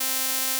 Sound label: Gauss Filt C4